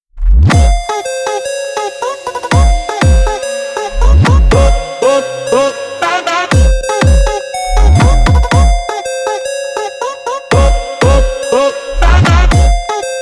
brazill phonk gym phonk 2025

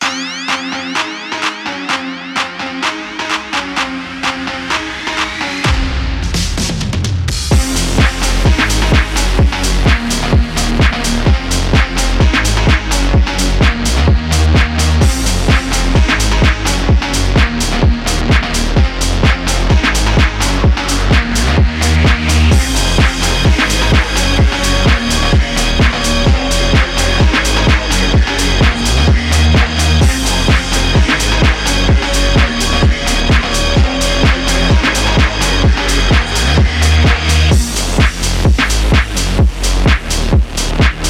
dark side low rider phonk house

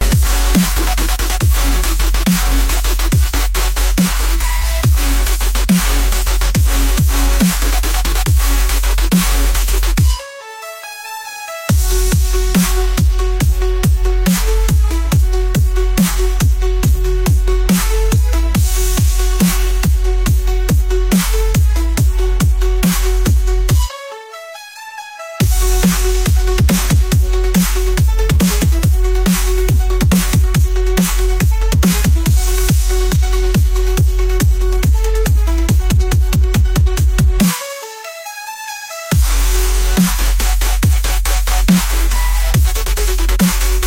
dark beats

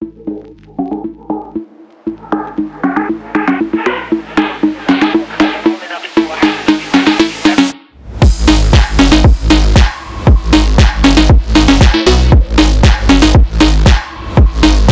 phonk

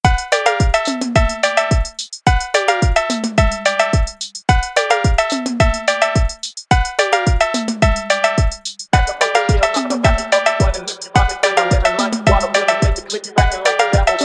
retro phonk

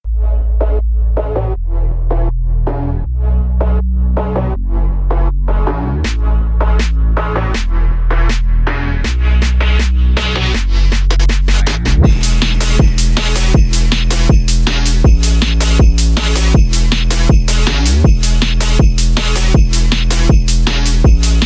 phonk track

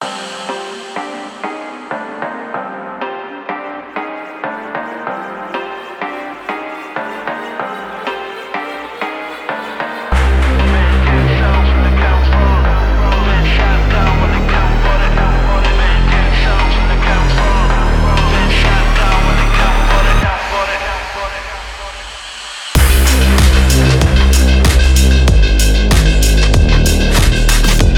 phonk house emotion

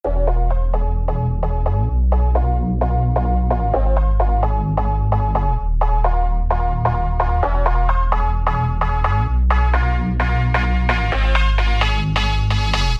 madness phonk soundtrack

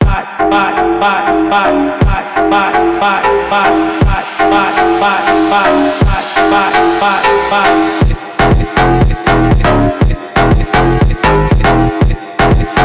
phonk

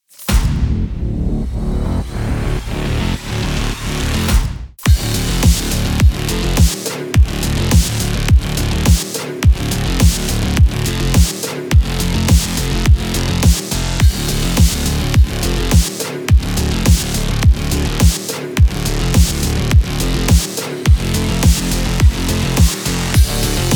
sport electro

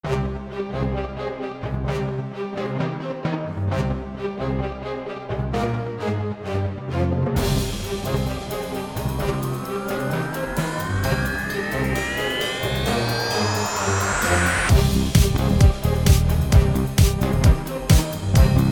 electro rock

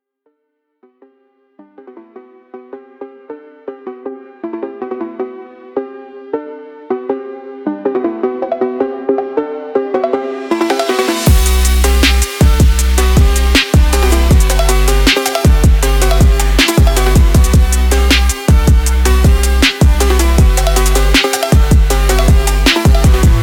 night phonk

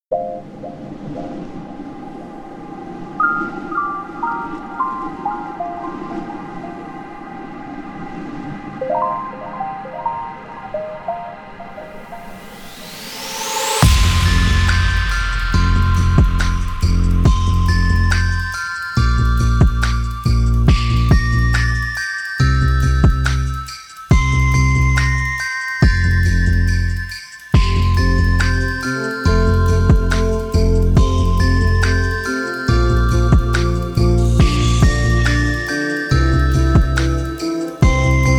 jingle bells phonk

